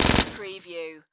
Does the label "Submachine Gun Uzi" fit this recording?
Yes